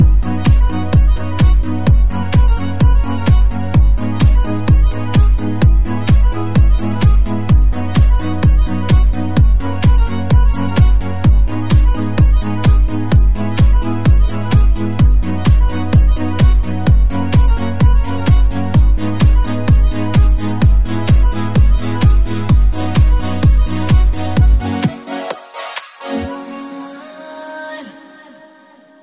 Basstype Remix

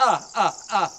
Laugh Ah Ah Ah; Count

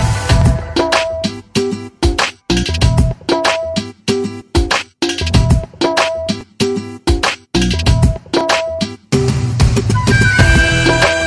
Romantic Tone

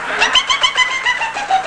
Pipipipi; Chavodel8

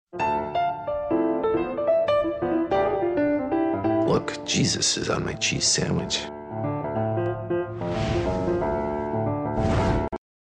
House Riffs

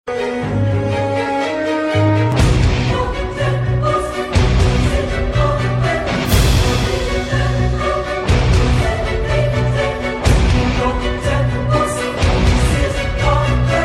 Hip Hop Beat

